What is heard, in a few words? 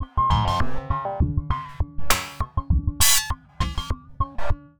perc 100 machine hop, Percussive Loops, 100 bpm; Sample Magic - Transmission-X